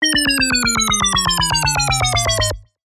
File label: multimedia game sound digital retro simple negative error descending; fast long; zapsplat